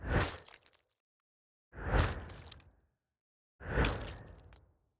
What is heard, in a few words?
Harpy Melee Attack